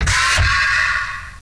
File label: jump pad